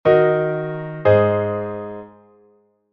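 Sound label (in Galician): cadencia picarda sol sostido menor; armadura 5 sostidos, dous acordes: re-fa-la-re; sol-sol-si#-re; 15 cadencia picarda sol num m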